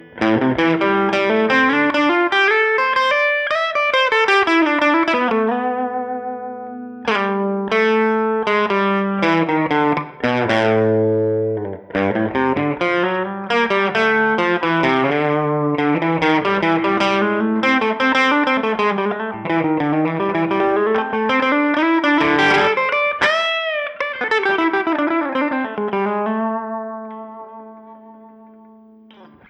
Clean, VOX AC30 + Centaur

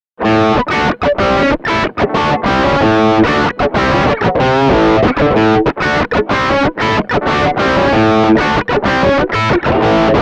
PAF Bridge Drive

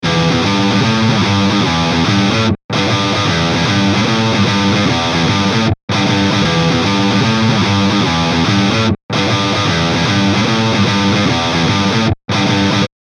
NECK, eclipse active, 5150

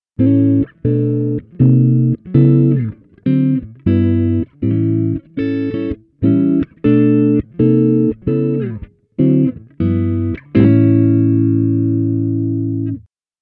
PAF Neck

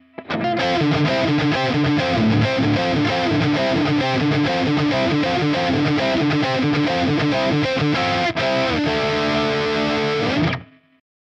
Rhytm, rebelliant neck

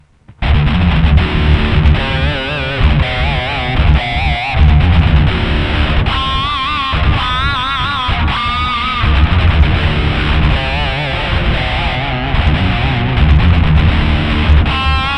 Hellfire Drive Solo

